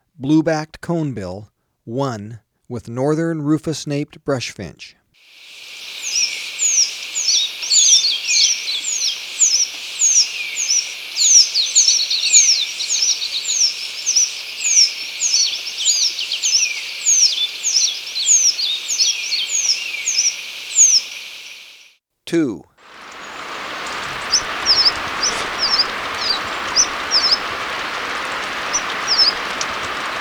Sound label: Blue-Backed Conebill, Birds of Ecuador; S. America